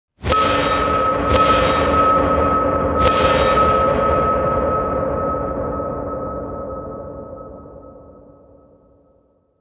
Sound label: Variant Dramatic Triple Metal Clang